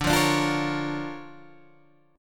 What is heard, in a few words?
D Minor Major 7th Sharp 5th, D, Guitar, Open G